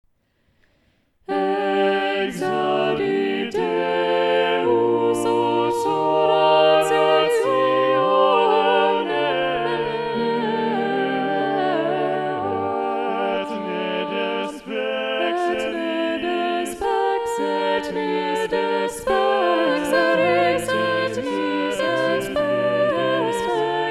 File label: Exaudi Deus SATB Balanced Voices; Orlandus Lassus